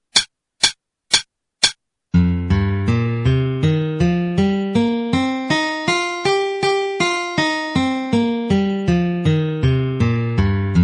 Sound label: C Minor Pentatonic, 160